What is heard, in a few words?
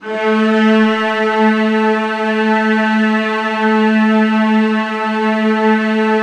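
VIOLAS B3; ILIO; Synclavier Strings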